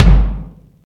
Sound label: normal hit clap